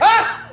"ha", Voice

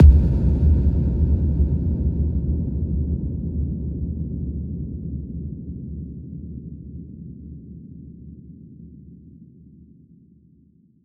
electric explosion; Crash Bang Boom

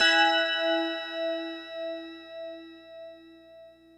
wubular, Bells; Misc Percussion

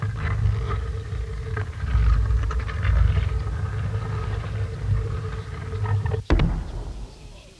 grinding sound, Rumbles

